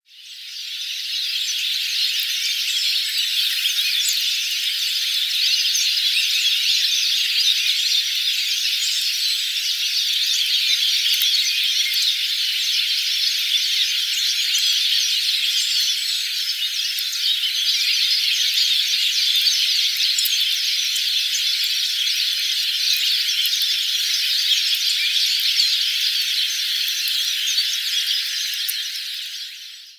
kashiradaka; AkitaKazuno; Kuroda Haruo

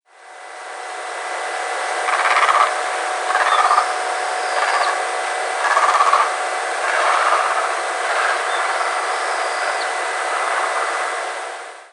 kurotsuraherasagi; itoman

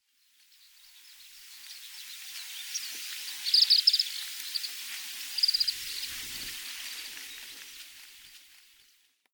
ojirotounen; Sakata

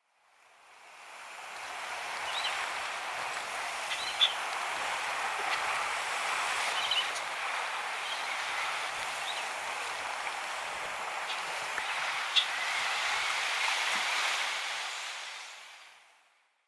aotsurakatsuodori; Taketomi

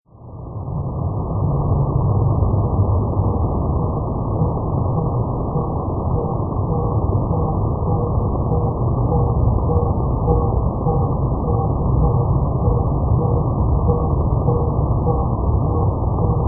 ookonohazuku; soeda; Manabe Naotsugu

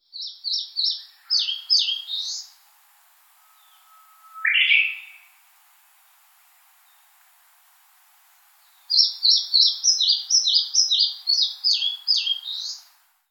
sendaimushikui; yaita; Ooi Tomohiro

